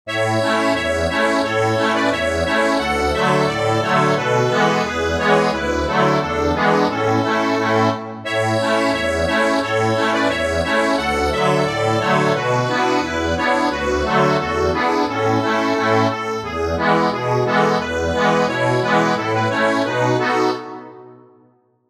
accordion, sheet music; Gathering peascods

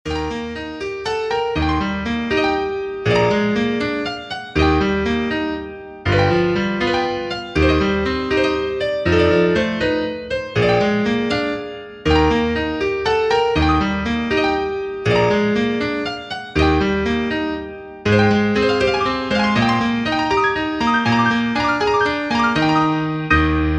PIANO

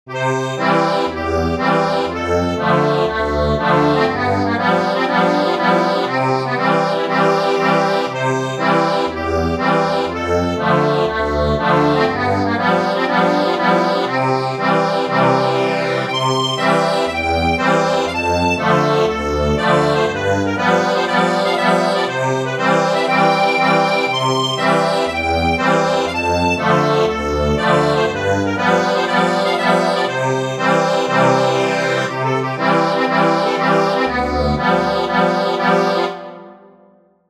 ACCORDION

